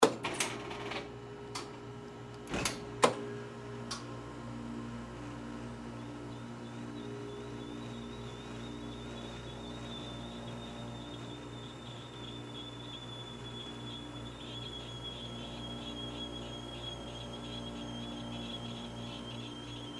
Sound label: Slurpee Machine, Industry, Slurpee, Machine, On, Run, Off, Squeaks; Machinery